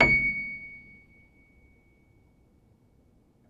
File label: Player, Upright Piano